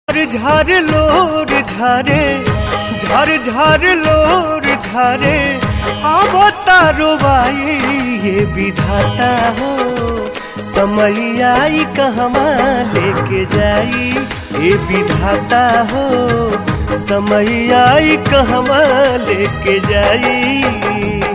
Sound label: BHOJPURI RINGTONE